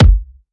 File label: SB ALKCOUSTIC Plonk